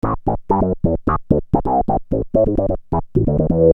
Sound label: Syn-Bass m Am, Techno Synth Loops, 130bpm; Sonic Foundry (Sony Creative Software); Signals I